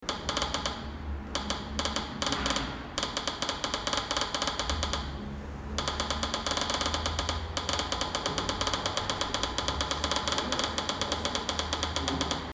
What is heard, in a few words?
metronome horn